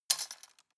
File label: cutlery plastic drawer place hard